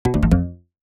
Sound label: error